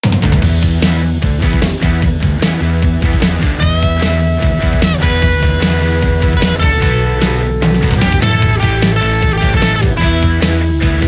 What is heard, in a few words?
vintage fender; softube